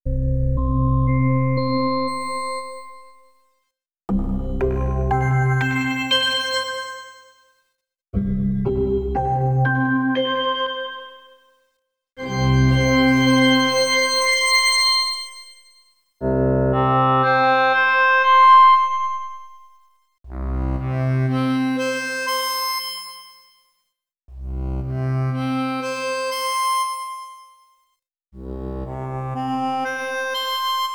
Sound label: Organ